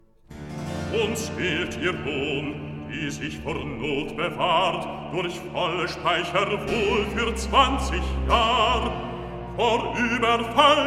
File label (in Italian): Recitativo; Gobrias, Cyrus; Uns gilt ihr Hohn; Handel Masterworks; Klassika